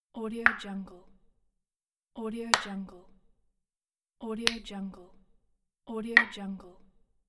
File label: carom